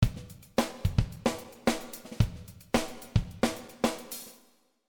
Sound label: Drums Boston chamber